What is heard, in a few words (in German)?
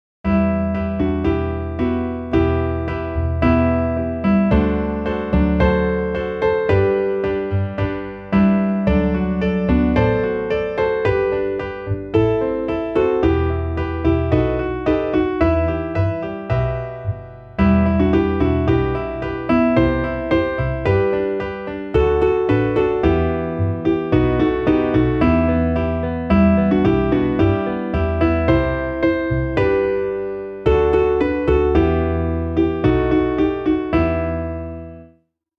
klavier; roter wein im becher